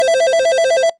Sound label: ring